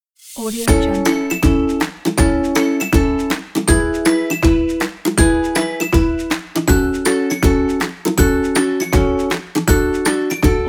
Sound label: positive happy logo